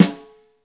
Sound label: 60S SNARE RIM; InVision Interactive; Lightware VOL-1; Instruments & Percussions